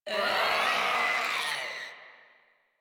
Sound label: Infested Runner Attack; Warframe; Runners